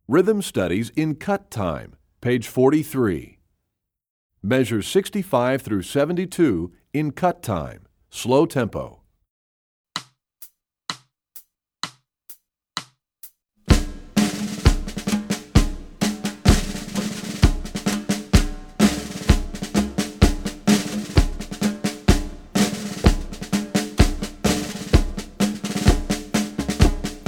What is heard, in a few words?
Rhythm Studies; EE2000 Book2